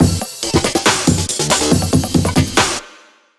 Drumloop; Ghosthack

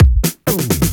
Fill Ins 128BPM; Vengeance Samples

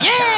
Synths B; vengeance essential club sounds-3

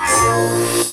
FX Alarm; Vengeance Samples

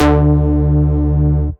Synth Cuts; Instruments; VES1 Synth Cuts

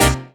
Synth Sound; Vengeance Samples; VEE Synth Shots

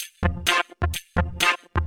Vocoder Loops 128BPM; Vengeance Samples; VVE1 Vocal Loops

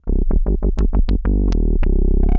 Roland F Line, Roland S100; musicradar-synth-samples

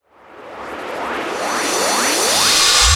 Uplifter

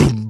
Beatbox Kick, Human Beatbox; Vengeance Samples; Special Sounds

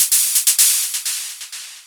VFX2 Loop 128 BPM

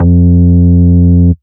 Root F, Bass; Vengeance Samples; Vengeance Ultimate Bass

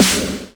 snares; Vengeance Samples

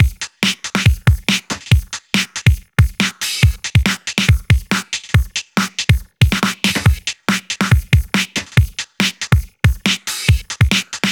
Loops BB 140, BreakBeats; Vengeance Samples

